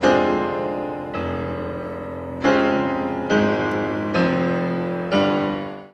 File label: short melody